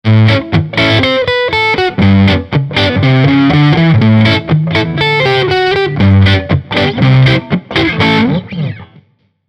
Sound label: Hagstrom D2H Neck OD